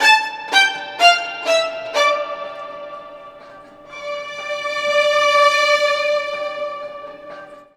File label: TAR STRG -R, Roots Disco; USB Soundscan vol.32; AKAI